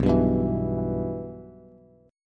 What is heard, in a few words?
B Suspended 4th Sharp 5th, Bass; strummed; Bsus4#5; 7,10,9,9; JGuitar